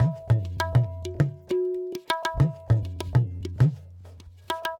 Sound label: distant rhythms